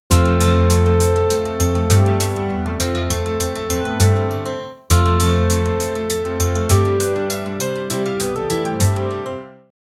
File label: east anatolian music with, folk